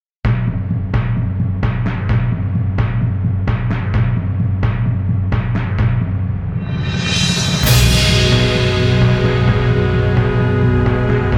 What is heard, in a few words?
Intense Suspense